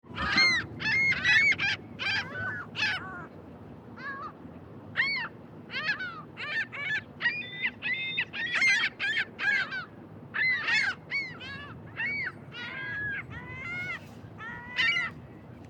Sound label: seagulls suburban parking lot, Seagulls, Suburban Parking Lot, Large Flock; Medium Flock Of Seagulls In Suburban Parking Lot . Some Traffic Rumble In B / G .; Birds; Animals